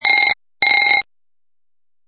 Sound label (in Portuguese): Toque